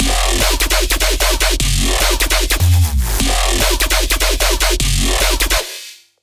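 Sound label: virtual dubstep loop 09 f minor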